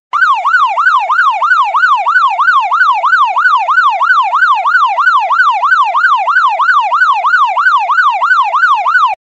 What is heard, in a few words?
a fast approaching police siren followed by a sudden stop